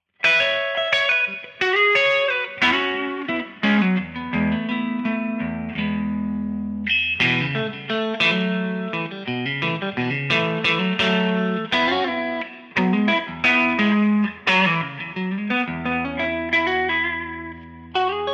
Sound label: Woodstock Plus Clean